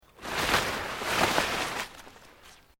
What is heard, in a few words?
PLANT LEAVES LARGE MOVE FAST, rustling